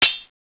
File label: Sword